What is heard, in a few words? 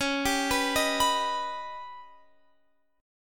Eb Augmented 7th Db